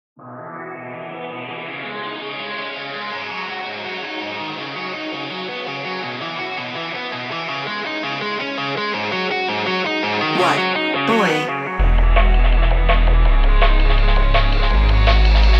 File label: 165 bpm Type Beat